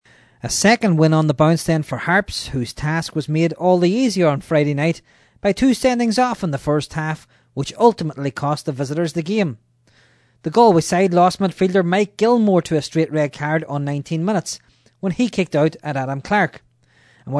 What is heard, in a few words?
HARPS